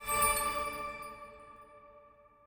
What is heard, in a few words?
hide gem; wild bazaar